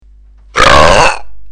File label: gut swirl; belch